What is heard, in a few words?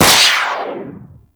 Skate Dash; Enemies; Skate Movement; Corpus Modular; Warframe; Corpus Modular Crewman